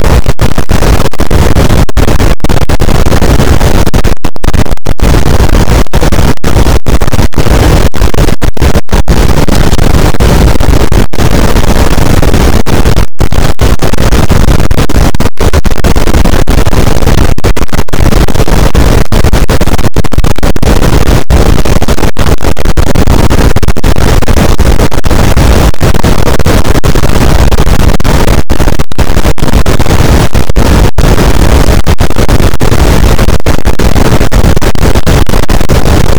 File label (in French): cachalot positif, boat; Physeter Macrocephalus